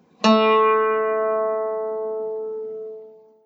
high a, a minor, guitar

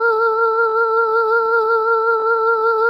ahh Bb

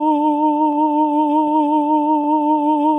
ahh Eb

